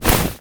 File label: run foley stop